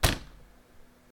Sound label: table hit